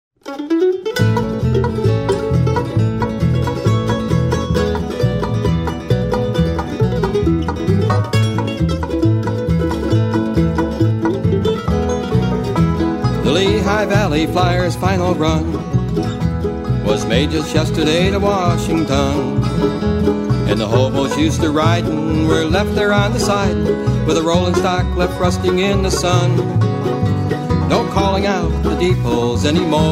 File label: Bluegrass